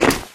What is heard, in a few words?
gear, metropolice; Garry's Mod; hl2